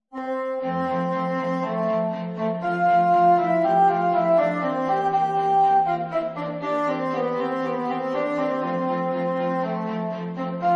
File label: Quartet